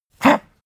pug gag short; natur-tiere; hunde

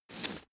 sniff; koerpergeraeusche; menschen; soundpool

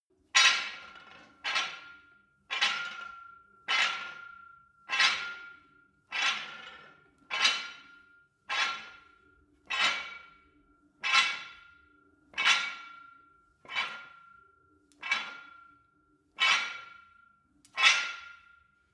barbell weight rattle sequence, fitnessstudio

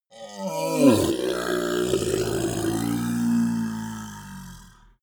monster dark growl bubbling; kreaturen

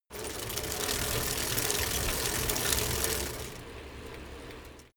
bicycle rickety pedal roll short

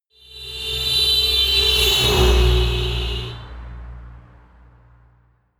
notification honk, autos; verkehr transport

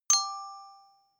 notification glockenspiel fifth

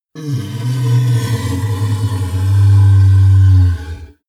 monster inhale low; kreaturen

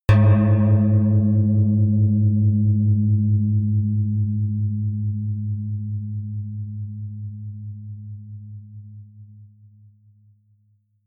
melodic odd pan bell; toene

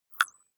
click synthetic squeak, signale

